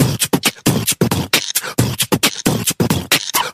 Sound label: how, Beatbox, Drum loops